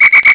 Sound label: Pinger; NetRexx